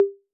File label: Conga Hi A 808; Clean; Digital